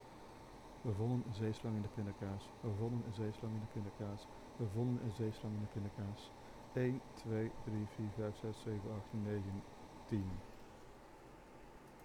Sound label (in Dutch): Airco